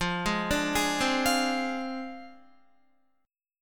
F Minor 6th F down Guitar

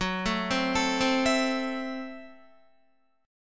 F sharp Minor 7th Flat 5th F sharp Guitar; arpeggiated; JGuitar

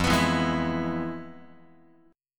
F-Minor Major 7th Flat 5th, Guitar; FmM7b5 strummed; JGuitar

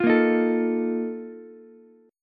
Bb Major 7th Suspended 4th, Ukulele; strummed; JGuitar

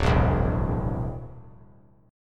D 7th Suspended 2nd Sharp 5th D; D7sus2#5 strummed

